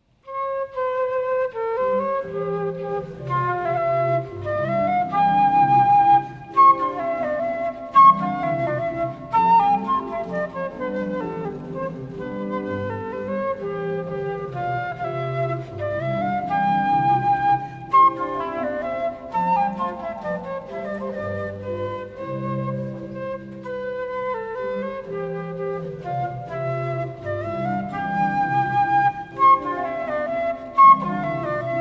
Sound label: Sonate fl org, concert; Vivaldi; concert jubile